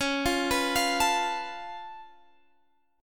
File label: C sharp Augmented 7th C sharp, chord